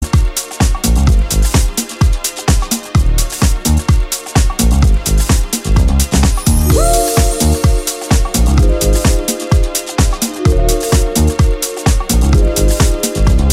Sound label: city fast lights call tone ringtone